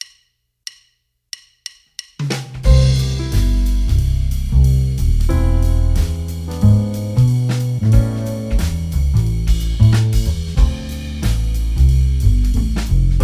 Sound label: jazz trio